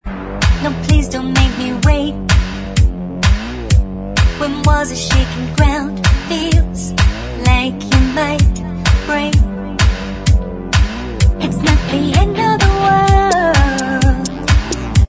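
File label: backing vocals track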